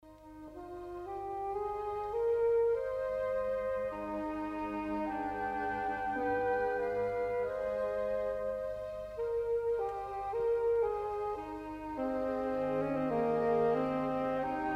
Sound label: sax solo; saxofono; strumenti